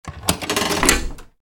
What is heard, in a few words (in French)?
levier